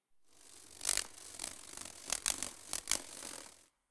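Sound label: ambience elves; wingsofriches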